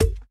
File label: Bounce Rubber